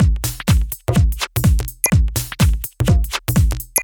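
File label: Drum loop 125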